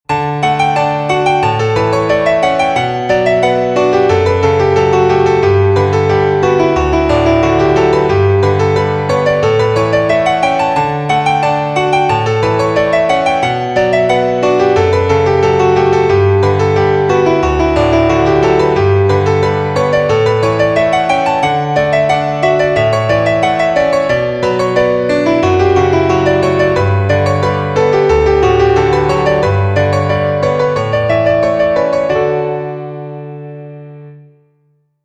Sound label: canon